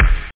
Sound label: bass drum; Groovy Bytes: Behind the Moon